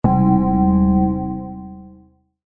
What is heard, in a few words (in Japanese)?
ガーン ショック 鐘の音